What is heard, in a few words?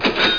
CASHREG